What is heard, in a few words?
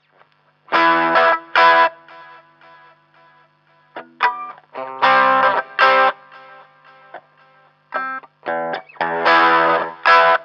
rythm